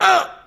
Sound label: Hit; Blind Quest - The Frost Demon